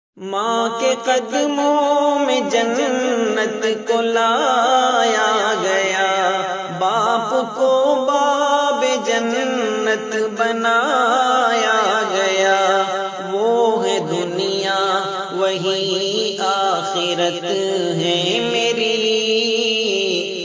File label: islamic beautiful ringtone qurawali ringtone arabic ringtones